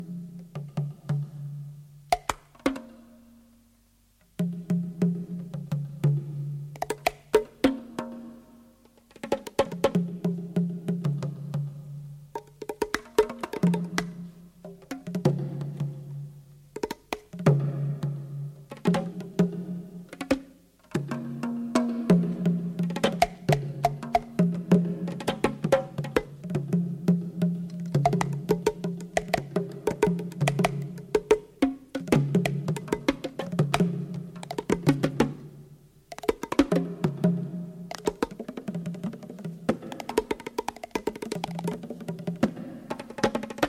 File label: Percussions